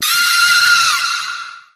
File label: scream